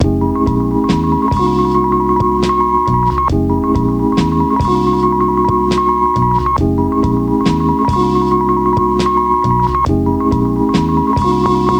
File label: Trill